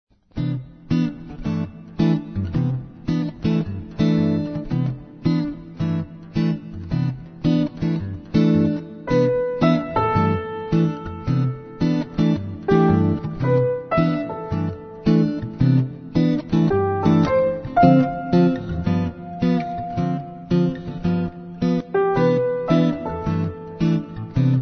blues; buzios

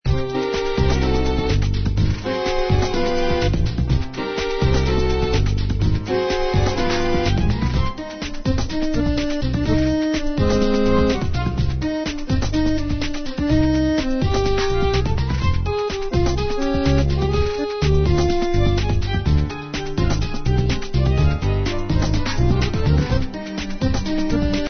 tango; globo

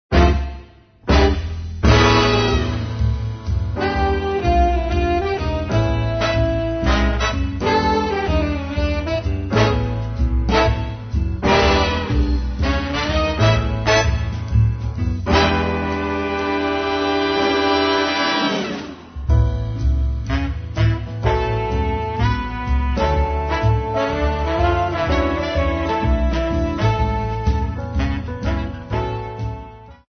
big band

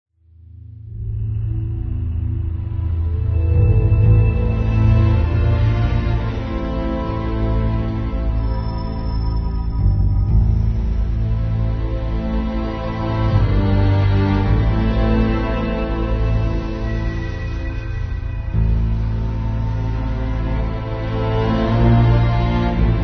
suspense; rio bonito

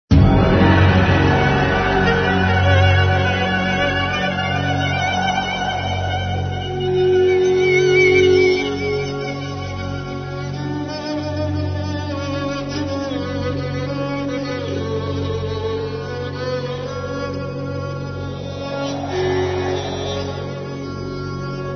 banjo

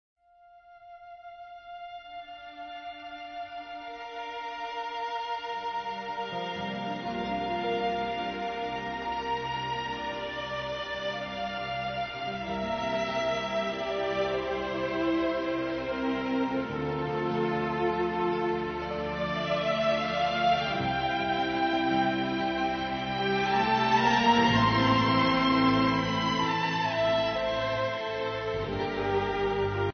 speranza suite instrumental; globo

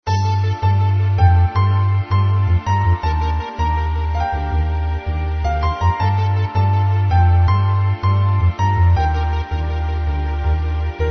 drum n bossa; globo

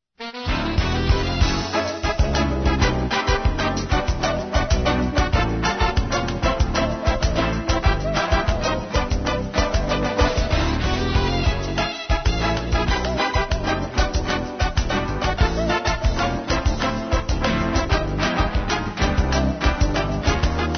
samba point

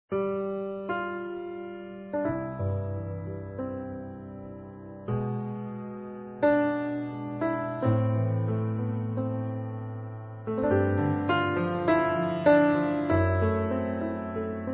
piano solo

